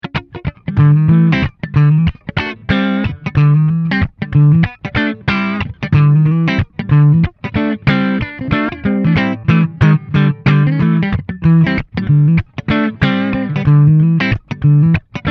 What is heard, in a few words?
Funky